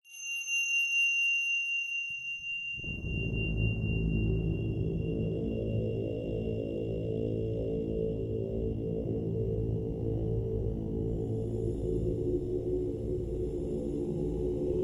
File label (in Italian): armonici